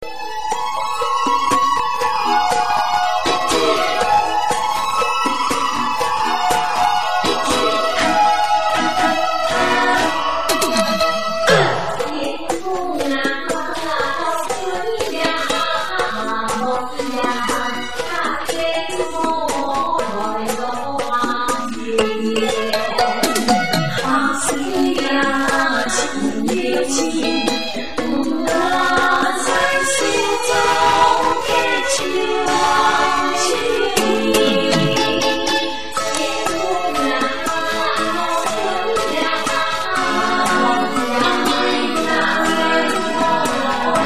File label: hakka popular song rain